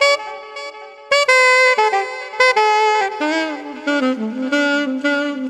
sax, loops, phonky

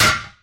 dub, Misc Percussion Ticks

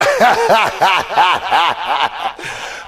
laugh; Voice; ooh ahh

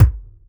Kicks Medium

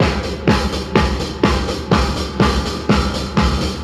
egg beater, Drum loops

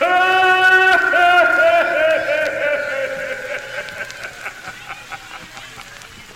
laugh echo; Voice; ooh ahh

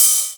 Hats Electro Open

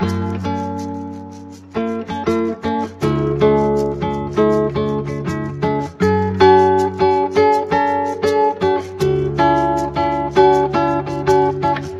guitar

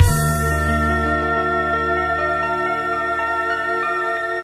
end note

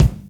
Kicks Loud

